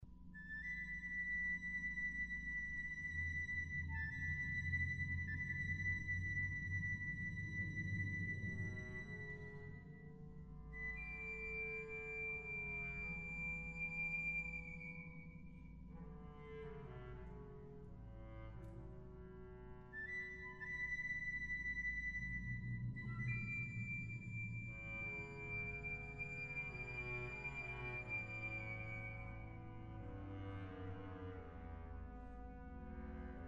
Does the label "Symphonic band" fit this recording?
Yes